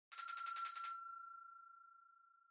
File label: Winning Bell Far Strong